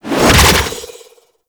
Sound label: Archwing Prime Sword Short Whoosh; Warframe